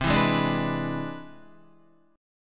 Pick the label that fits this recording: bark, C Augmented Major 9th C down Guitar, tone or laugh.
C Augmented Major 9th C down Guitar